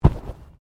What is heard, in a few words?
Bodymovement Fall Light